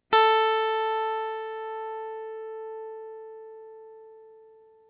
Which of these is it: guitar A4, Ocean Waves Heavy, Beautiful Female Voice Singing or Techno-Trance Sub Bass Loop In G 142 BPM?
guitar A4